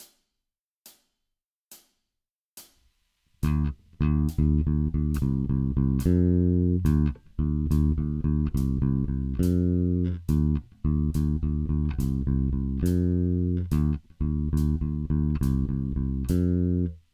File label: Heavy rock blues, baixo; heavy rock shuffle